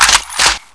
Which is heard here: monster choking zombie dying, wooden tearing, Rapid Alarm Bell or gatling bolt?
gatling bolt